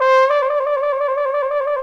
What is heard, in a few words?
TROM DOIKS